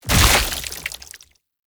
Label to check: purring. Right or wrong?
Wrong